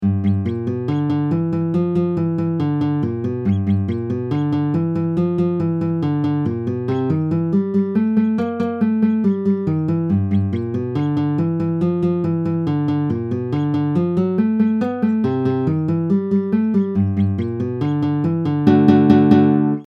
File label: G Blues Boogie